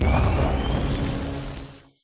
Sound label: prepare blind, illusion illager; Minecraft